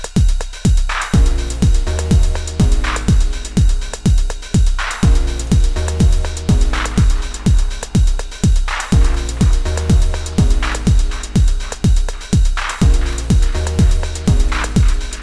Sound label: 909-ish; Straight outta Rytm; Took preset kits, tried to make something, changed on the fly; Straight outta Rytm #2